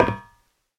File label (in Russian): korotkij metallicheskij shelchok